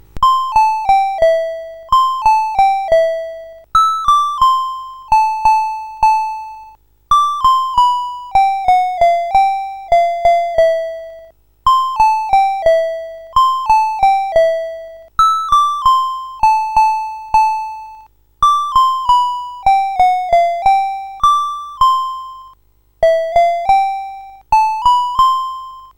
sid, CSG 8580

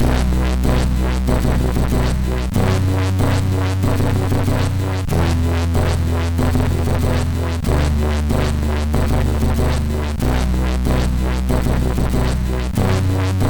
Converter intro, sid MOS6581; HVSC; MOS6581R4

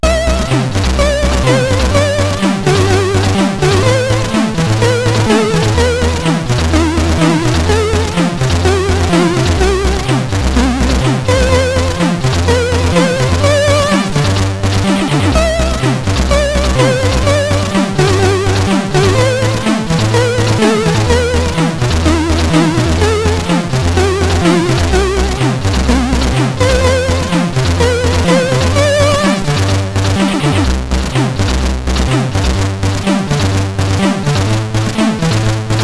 Russian Anthem, sid CSG8580R5

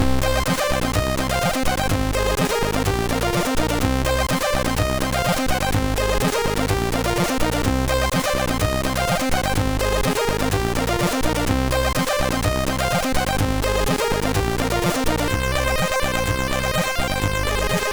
Rave Beat, MOS6581R2

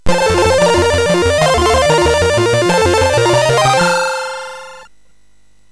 sid MOS6581R3, GAMES; Continental Circus

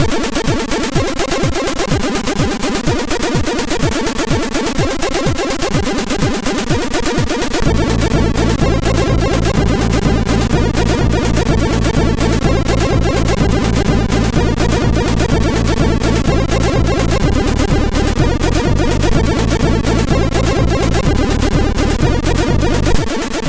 Techtune, sid MOS6581R2

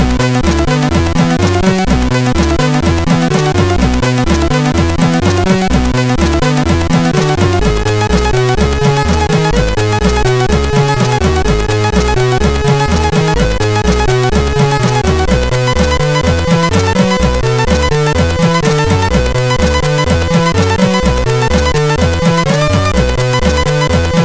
Rock Tune, CSG8580R5

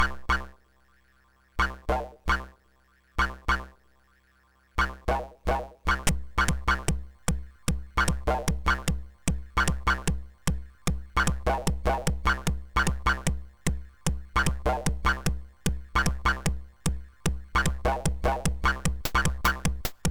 Boing

